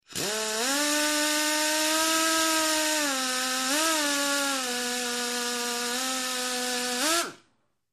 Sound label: Air Drill Straining; air tool